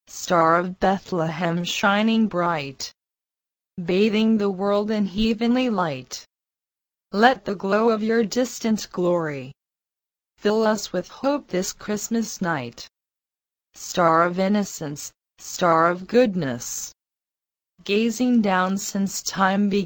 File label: diction alto